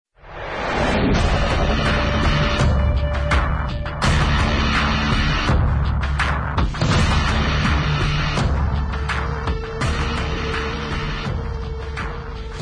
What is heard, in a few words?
round start; cstrike; codmw2